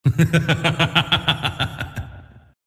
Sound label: evil laugh